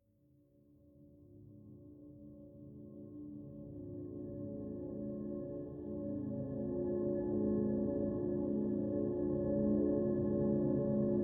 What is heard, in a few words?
Savannah Music